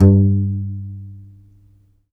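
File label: contrabass balalaika, Plucked Strings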